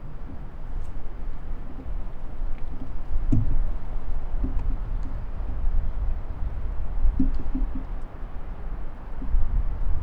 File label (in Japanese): 下り電車通過。 続けて上り電車通過。; 録音したファイルを、本体でノーマライズしました。; H5studio 内蔵マイク＋ZOOM ヘアリーウィンドスクリーン WSU-1